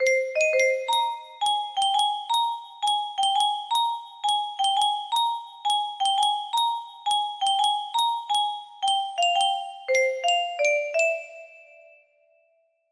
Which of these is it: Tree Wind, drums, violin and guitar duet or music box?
music box